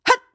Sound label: Fight Yell